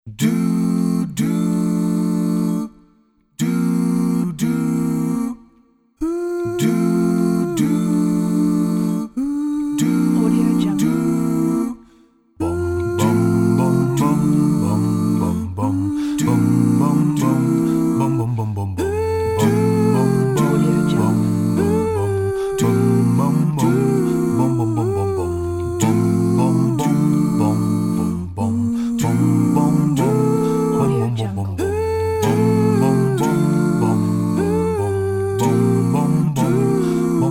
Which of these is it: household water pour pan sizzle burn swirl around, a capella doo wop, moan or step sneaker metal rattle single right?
a capella doo wop